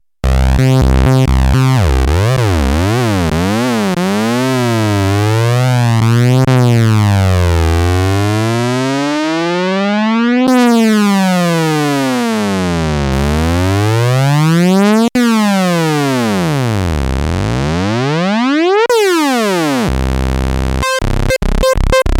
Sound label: glide; Creamware minimax